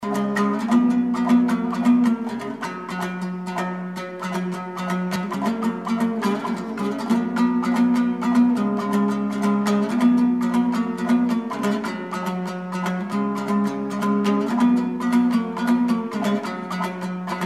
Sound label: dumbra